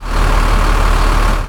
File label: engine; minetest; digtron